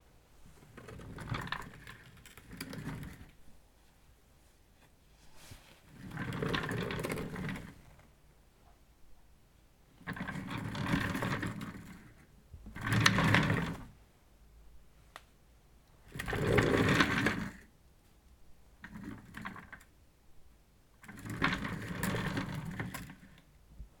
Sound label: Office Chair